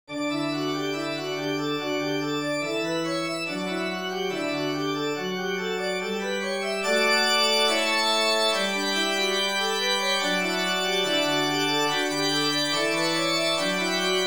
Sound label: with Organ, Handbells; From All That Dwell; Laast Uns Erfreuen; Two Octaves